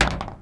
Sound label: metal; Mafie 1